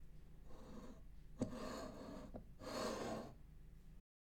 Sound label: Moving A Pot On A Wooden Table